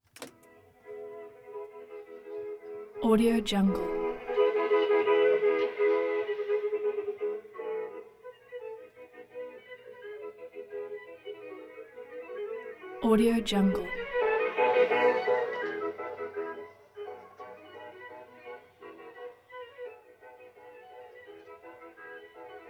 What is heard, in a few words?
calliope bys; prosoundfx